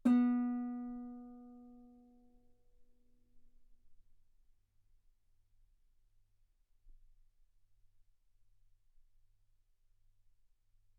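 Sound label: Guitar ff B string B3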